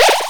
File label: heal; deltarune